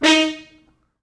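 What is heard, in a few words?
French Horns stops, D#3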